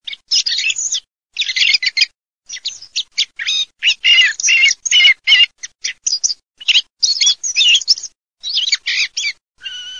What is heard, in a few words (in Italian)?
TORDO